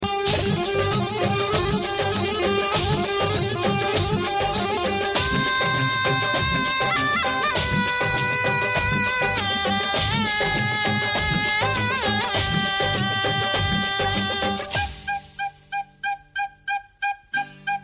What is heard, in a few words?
instrumental ringtone